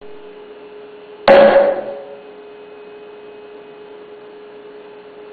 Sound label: Piping With Designed Damping